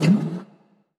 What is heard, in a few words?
Aircrafts Engines Packard Merlin Prop; DCS